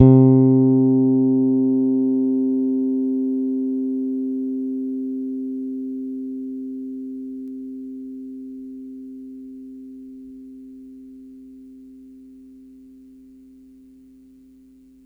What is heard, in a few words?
Gs C4 hard, Bass, Pedulla Thunderbass; Giga Samples Collection